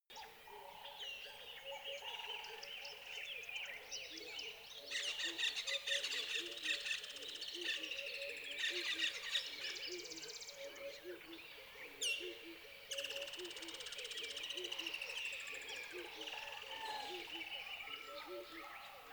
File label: tropical river lively calm wildlife